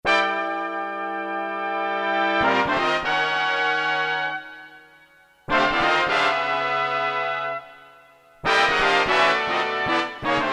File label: Brass, Brass Hits; Korg DSS-1